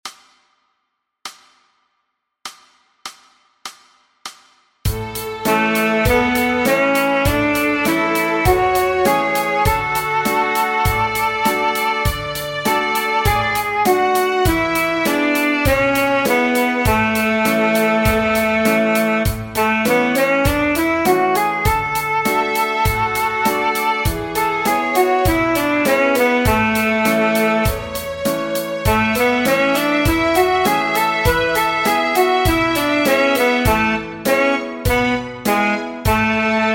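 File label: Sax F sharp Scale Quarter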